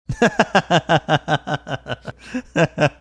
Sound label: laughing